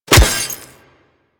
Melee Staff Impact Robotic Flesh; Warframe; Weapons; Staffs

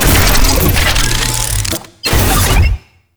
Turret Slow Deploy; Warframe; Zariman

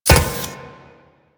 Moa Step; Warframe; Quests; The New War; Veso

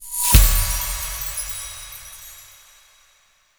Game Win; UI; Minigames; Pied Piper

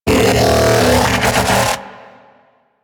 Mech Ambient Vocal; Nechro Mech

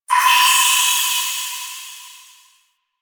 Distant Drip, Ambience

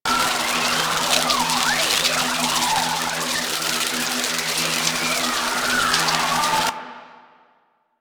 Electric Sparks Loop, Ship; Ambience; Props; Warframe; Corpus Ship Remaster

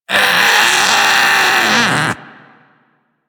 Infested Splitter Top Vocal Alerted; Warframe; Grey Strain

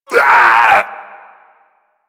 Scream Male; Grineer Finisher Grineer Male; Warframe; Kubrow; Feral

